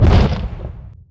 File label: strider step; Garry's Mod